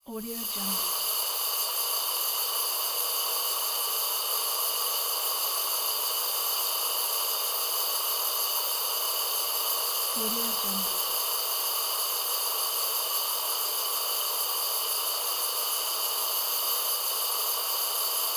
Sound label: gas; blackdragonfx